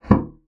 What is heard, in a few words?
bassoon slap; Xsample VOL-6; C BASSOON